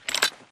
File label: wpn arl reload; Titanfall 2